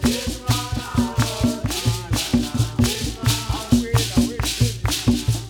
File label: RITUAL SONG, RITUALDNC; Spectrasonics; Heart of Africa 2